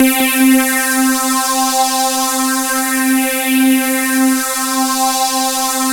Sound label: BIG SHIPS C5, SYNTH; Q Up Arts; Dream Experience